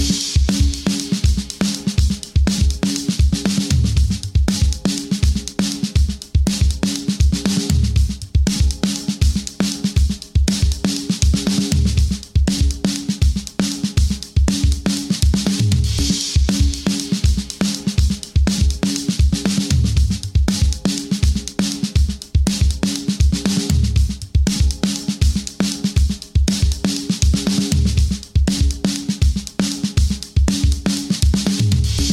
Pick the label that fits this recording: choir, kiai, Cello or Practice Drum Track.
Practice Drum Track